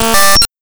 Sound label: D70 DRUMS, Roland D-70